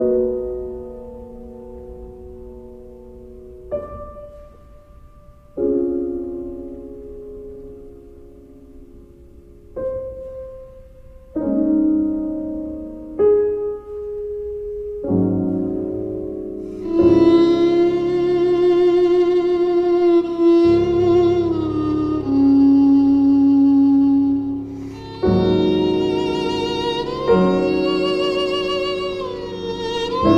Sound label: piano